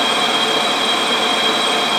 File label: Inharm, Noises; Xfer Records Serum; Serum Presets; FP Inharms